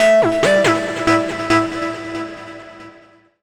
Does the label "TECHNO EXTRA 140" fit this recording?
Yes